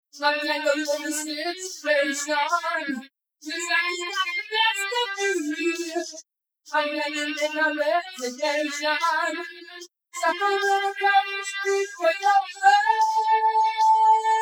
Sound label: VOCAL ONLY